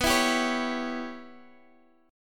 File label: Db Minor 7th Flat 5th B